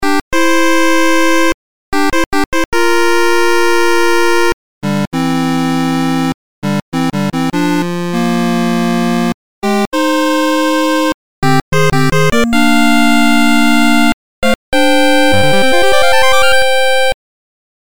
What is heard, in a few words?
8bit; jo jokyoku